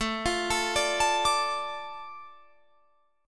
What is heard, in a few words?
A Suspended 4th Sharp 5th, Guitar Drop D